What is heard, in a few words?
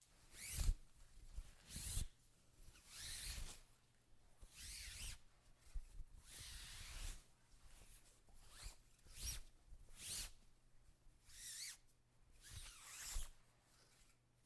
sheet woosh thin